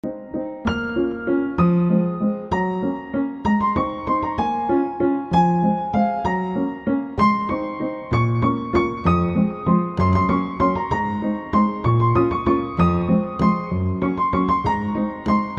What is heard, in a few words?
piano ringtone